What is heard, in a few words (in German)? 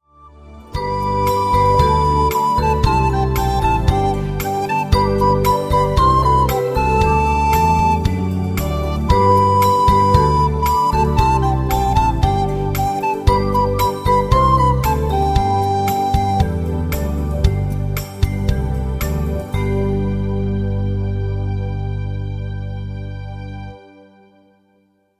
floetenmelodie playback